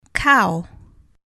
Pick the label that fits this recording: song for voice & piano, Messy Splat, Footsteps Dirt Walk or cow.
cow